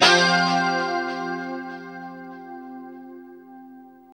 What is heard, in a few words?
2CHD F 4 -R, Guitars; Spectrasonics; Hans Zimmer Guitars VOL-1; JC-SHEEN